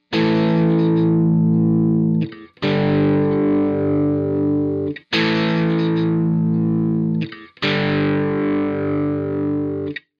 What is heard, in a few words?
shelving filter; orinj